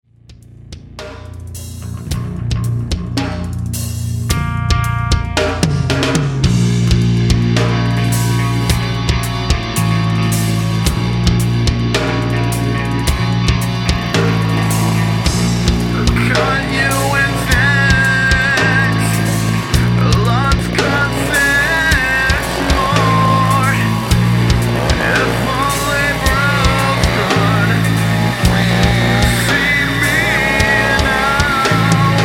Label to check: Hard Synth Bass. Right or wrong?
Wrong